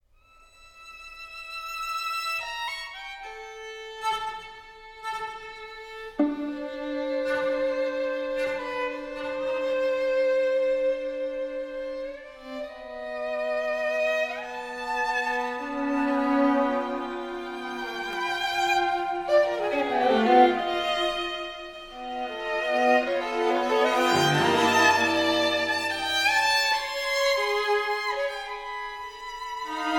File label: String Quintet, Cantabile; free and flowing-crisp & energetic- presto